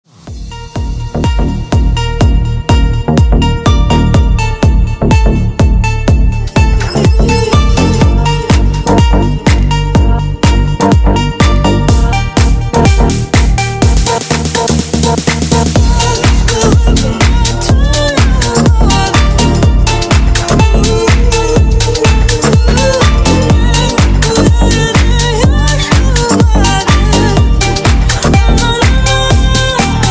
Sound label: deep remix